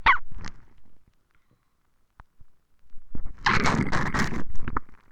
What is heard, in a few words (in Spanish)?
toma mate